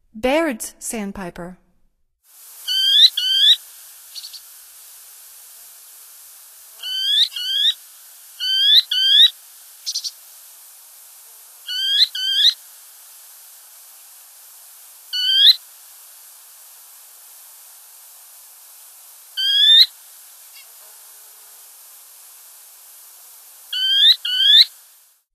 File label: Bairds Sandpiper, Songs of Birds of North America; Stokes